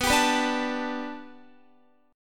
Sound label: B Minor 7th Sharp 5th B Guitar, chord; Bm7#5 strummed